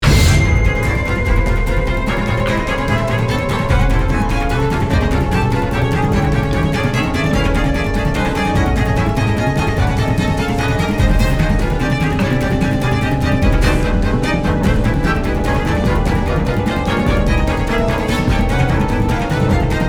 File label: Action (Loop)